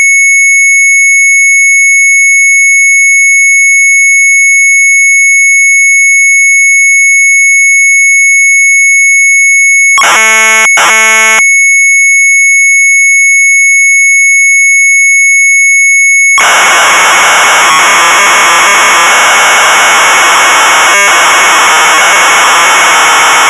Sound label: MZ800 Tape, sharp; QRA BEREKENING; retromelsarchive